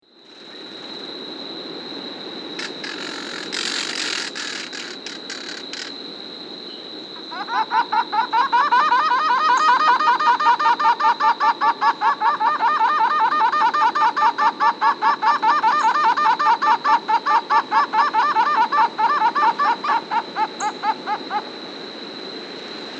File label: Call and duet, Birds of the Costa Rican Cloud Forest; N. America